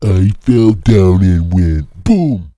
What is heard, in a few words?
fall; ogro